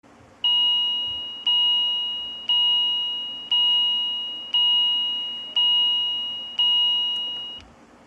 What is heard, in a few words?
Red Alarm; Philips